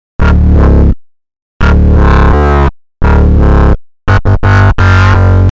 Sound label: Neurobass Example